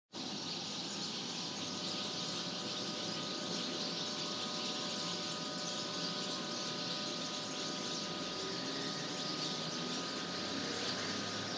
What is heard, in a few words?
coastal birds